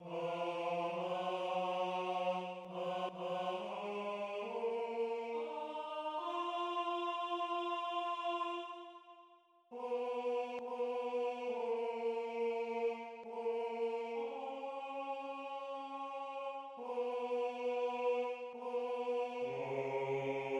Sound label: tenor; Yihyou leratson re